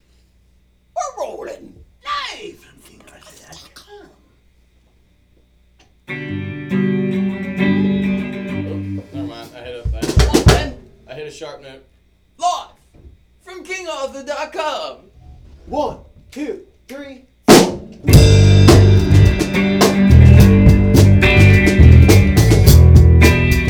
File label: end pop version